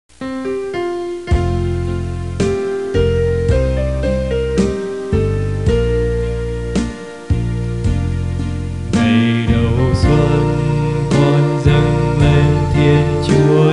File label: alto